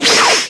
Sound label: laser; css; erasounds